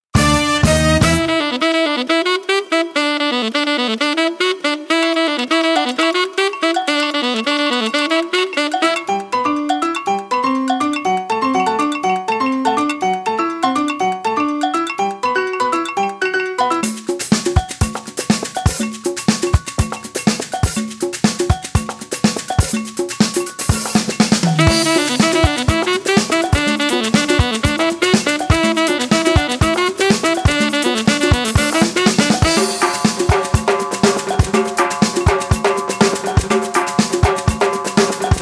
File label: Conga